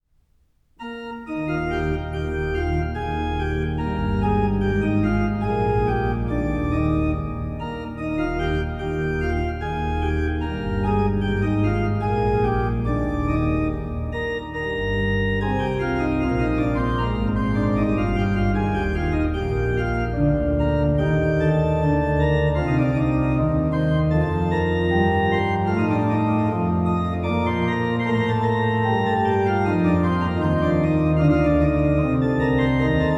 Wachet auf, ruft uns die Stimme, Chorale, Great Organ; BWV 645 Schubler Chorale No. 1; Bach; Bach Great Organ Works; Johann Sebastian Bach